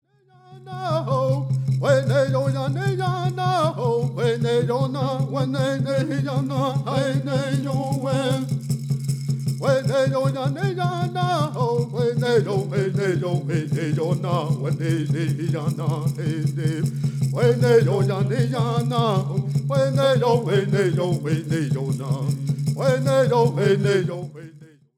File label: Prayer Song